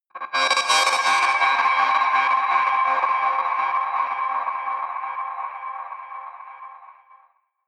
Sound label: stutter breach high, drones